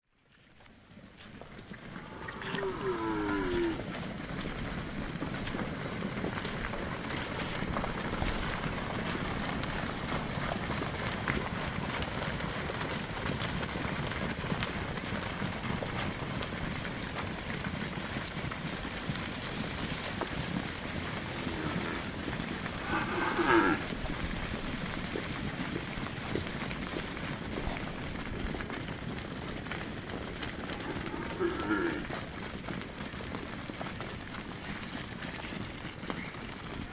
Cows Running About

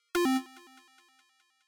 Fail

